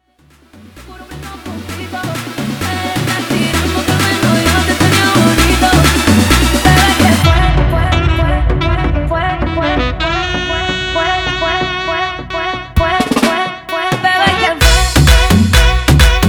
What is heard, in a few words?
guaracha remix 130 bpm